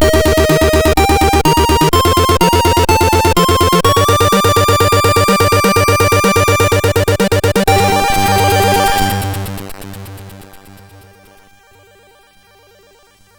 sid MOS6581R2; hvsc

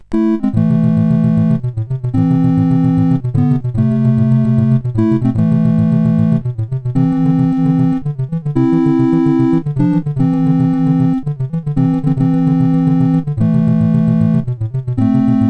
sid CSG8580R5; Ikari Warriors USA Version; hvsc

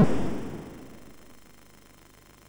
sid MOS6581R2; hvsc

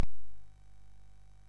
sid MOS6581R3; hvsc

